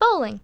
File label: bowling; rev bowling; The Interactive Alphabet